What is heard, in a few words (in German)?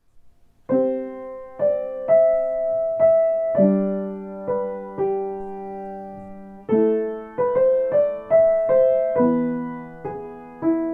Greensleeves Variationen fuer Klavier solo